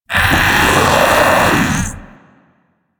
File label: Infested Splitter Joined Vocal Alerted; Warframe; Grey Strain